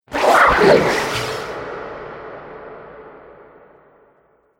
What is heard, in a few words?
Alien Slurpy Atmospherics